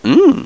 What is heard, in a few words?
exclaim; Bonzala